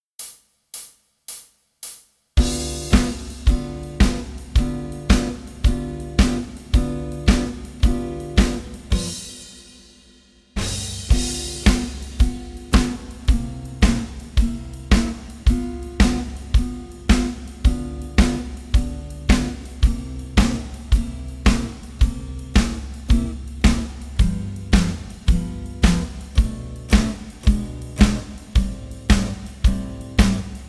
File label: Jazz BASS Play Along BLUES